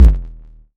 modular deep, kick; Ableton Foundation FreePacks

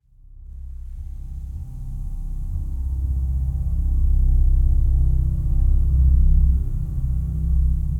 Serpentine Drone, Guitar; Instruments

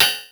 Bell; Ableton Foundation FreePacks

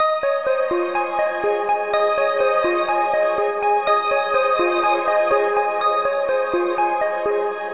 Wistful Plucks - C#Maj 124 bpm; MIDI Clips; Tonal; Motifs; Ableton; Mood Reel

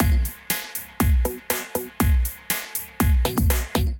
Neon Sneaker Kit, Drums; Ableton; Build and Drop

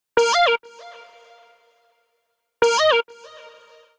Lead Bb minor 77bpm; MIDI Clips; Melodic; Evening Casual; Ableton; Factory Packs; Chop and Swing

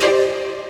Chord; Ableton Foundation FreePacks; Wicked Kits

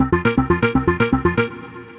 Synth Rhythmic; Clarks Revenge

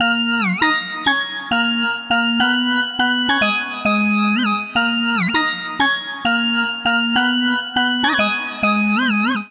Twisted Organ Riff Eb Minor 101 bpm; MIDI Clips; Tonal; Melodic; Ableton; Factory Packs; Chop and Swing

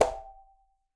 Quinto B Slap Open, Conga; Latin Percussion; Drums; Drum Hits; Ableton; Factory Packs

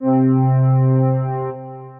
Organ, synth keys; St Krillion Organ; Ableton; Factory Packs; Singularities

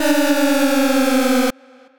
Descend, Effects; Max Descend

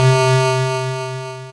Fuzz Assist; Ableton; Factory Packs; Retro Synths; P-50 Linear; P-50 Structures; P-50 Partials

